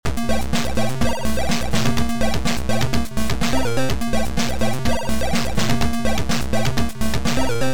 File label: rhythm; Kawai